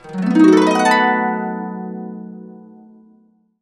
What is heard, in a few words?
B, Harps; Complete Harps; USB Soundscan vol.62; AKAI